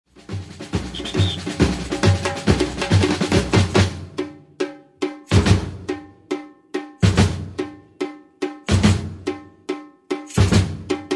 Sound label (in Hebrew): Break Short, מעבר 3ב קצר; Batucada